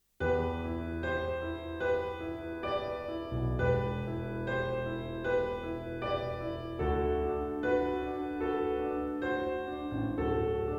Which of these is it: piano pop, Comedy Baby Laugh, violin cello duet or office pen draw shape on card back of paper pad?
piano pop